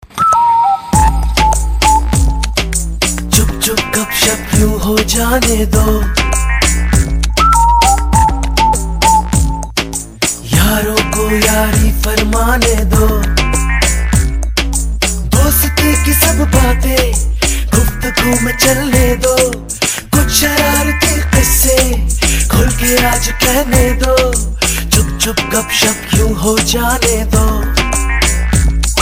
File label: Loud; Aircel 3g